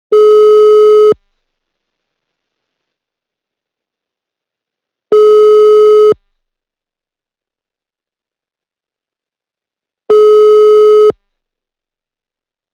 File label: phone calling